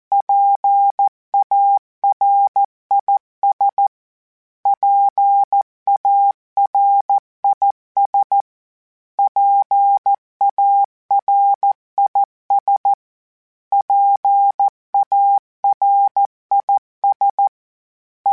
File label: PARIS, Morse Code; HamRadio